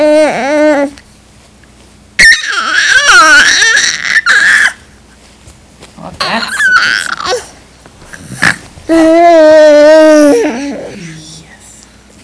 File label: vocal play